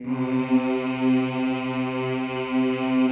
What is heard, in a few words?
mix combi; Sound & Vision - Gigapack I CD 2 (Roland); Combinations